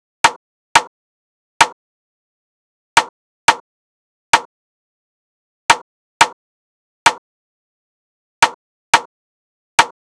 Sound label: Wood Block Drum Loop - 176 BPM Rimshot; Cymatics; Mystery Pack Vol 4